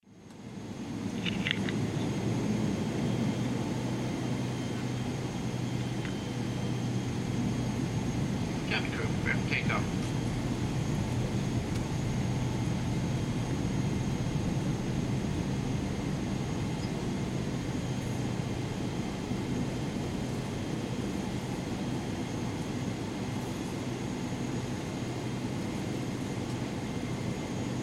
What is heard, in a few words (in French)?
decollage